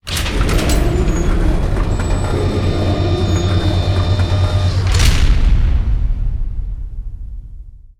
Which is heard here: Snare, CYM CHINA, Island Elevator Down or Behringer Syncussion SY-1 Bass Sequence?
Island Elevator Down